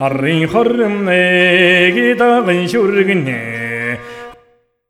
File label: MONGOL, Asian Traditions